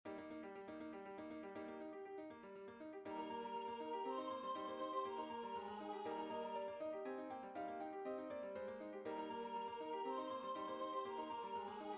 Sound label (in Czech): sanctus sopran; faure